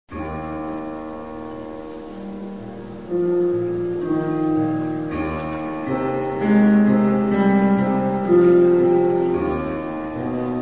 blues

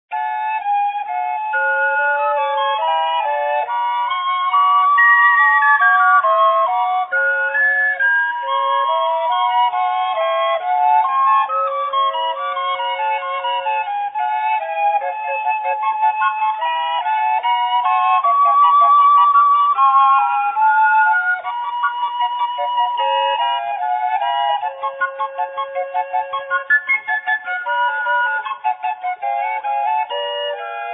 sopilka

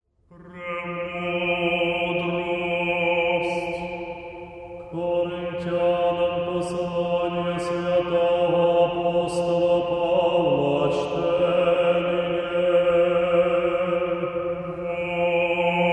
Choir Liturhia; Kyiv

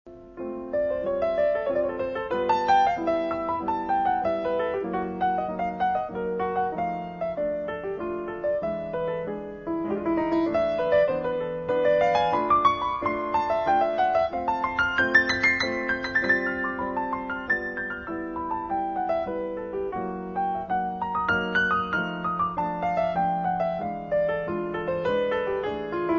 quiet jazz

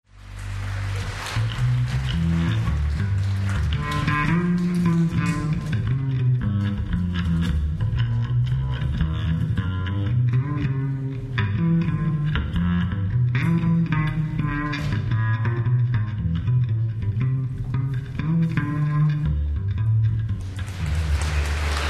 sax quartet swing; kyiv

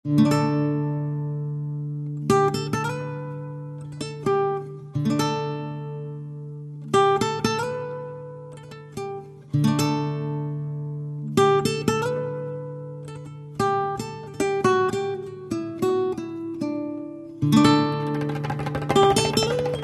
guitar studio

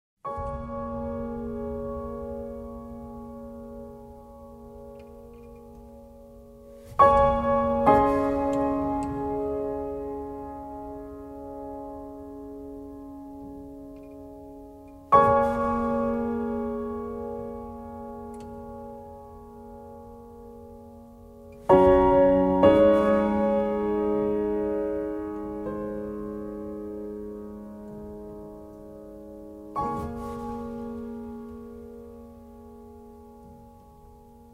Piano

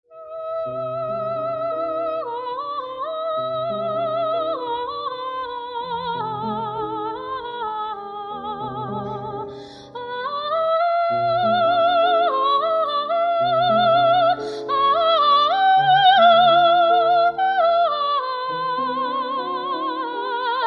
vocal miniatures

